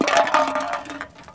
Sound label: Tin Kick